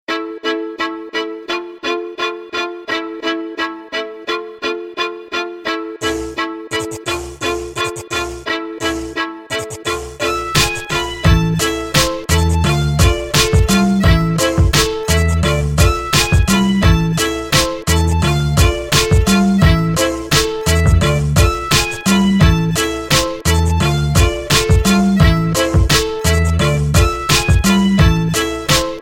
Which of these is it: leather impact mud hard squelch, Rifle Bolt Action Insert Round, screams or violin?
violin